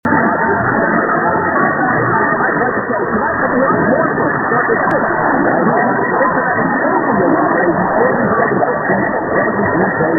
oldies radio, Florence KY; mw dxing; wdjo

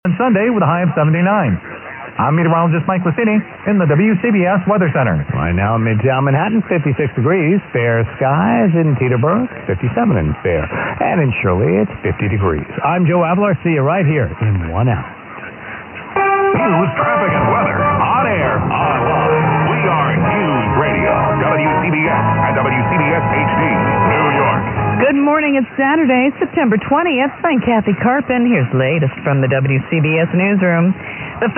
880, mw dxing; wcbs

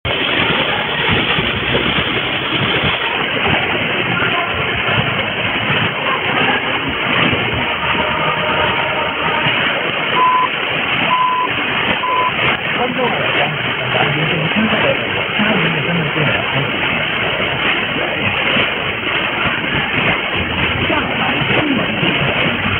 distinctive pips; 1470; vancouver; mw dxing; cjvb

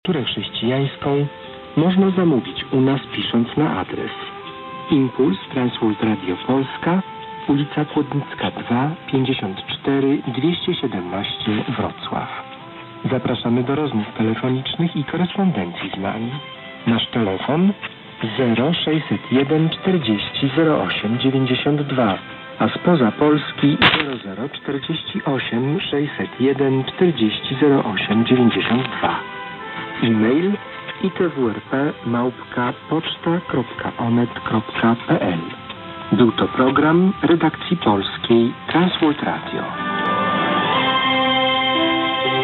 tirana int sig 1395, mw dxing

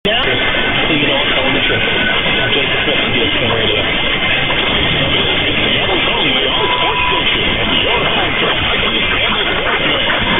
bad id only, mw dxing; 950; kjr; seattle

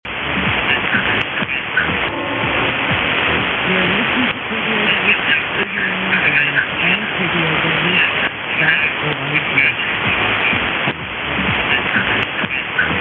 id only, mw dxing; 1400; kbrw; barrow

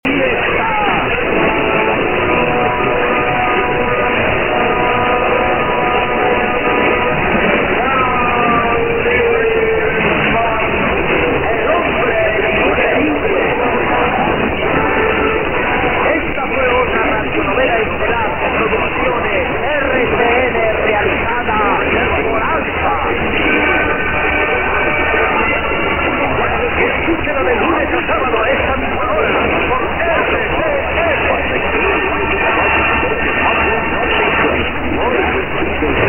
920 unid poss mexico, mw dxing

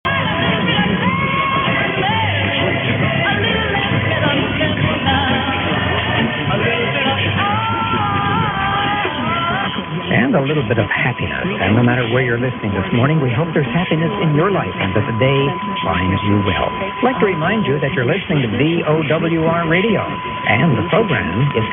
800, mw dxing; vowr

